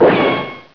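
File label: ricochet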